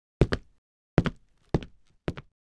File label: book steps